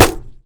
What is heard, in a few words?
ceiling tile impact bullet; physics; plaster; Counter-Strike Global Offensive